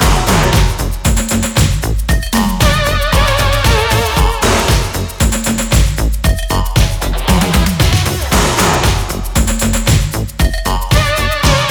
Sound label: Spooky Breakdown Loop